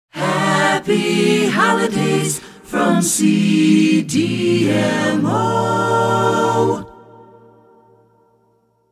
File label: Jingle; Short Attention Jingle Show IV